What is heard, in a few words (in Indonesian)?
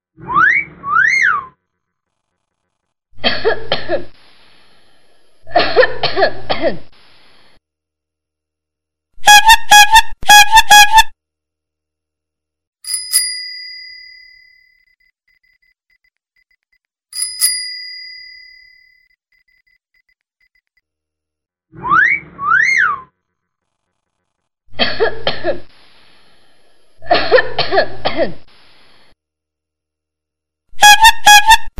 masteran spesial beo